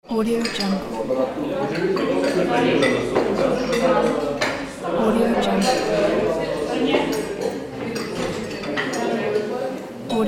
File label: canteen ambience